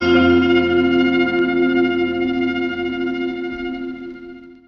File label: suspenseful, String